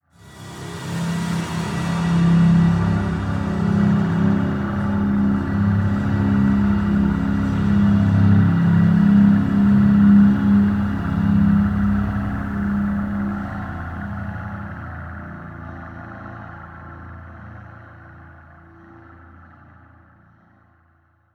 Pad

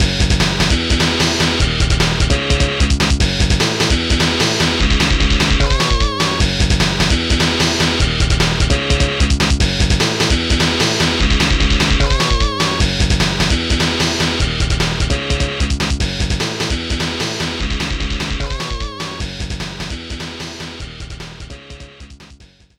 Jail Break, pinball

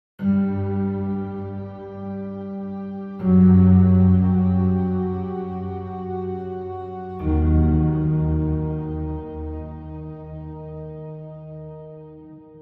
Dark piano Sample

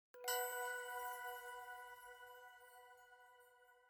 item Jingle Chime Only B

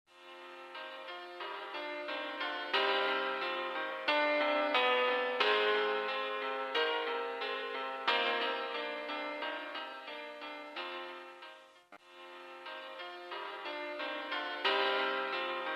Achievement Tune; POPGOES

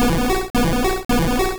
Smog

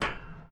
walk metal

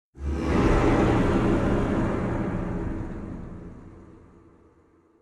SHOCK; F.E.A.R. Perseus Mandate

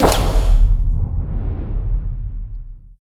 Bullet Hit

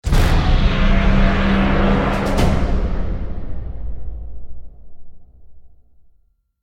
Stinger Action Medium

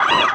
UI Sacrifice Bizbots

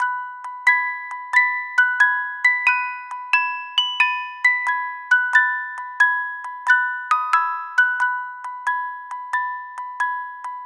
Blues (Music Box); animal crossing new leaf welcome aamiibo soundtrack

